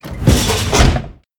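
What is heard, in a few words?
Engineer Door Close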